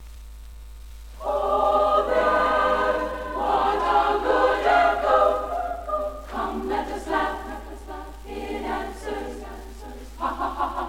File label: Echo Song; 1982 Terry Parker High School Chorus